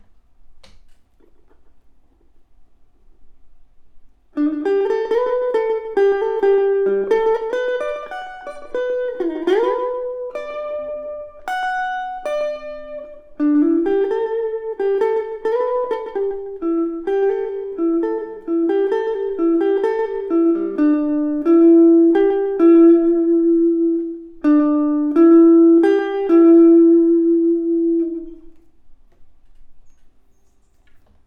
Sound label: Elect Guitar